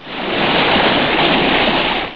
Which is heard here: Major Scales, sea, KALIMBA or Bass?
sea